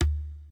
reels stop; DragonsLucky8WD; wazdan

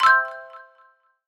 win; Beauty Fruity; wazdan; ultrafast

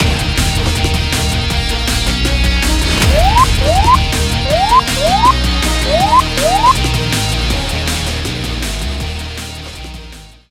big win; Burning Reels; wazdan

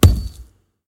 gambling mouse click; Burning Reels; wazdan; ultrafast

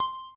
bonus counter; Bell Wizard; wazdan

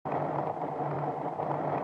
roll; Colin The Cat; wazdan

